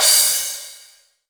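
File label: cymbal, real mega drums; Best Service; Real Mega Drums VOL-1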